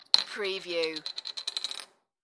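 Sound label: Plastic Bottle Cap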